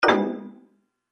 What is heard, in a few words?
Call Hangup; ICQ6.5